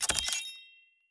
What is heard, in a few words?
spin Button; netent